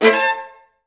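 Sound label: violas A3; Best Service; Peter Siedlaczek's Advanced Orchestra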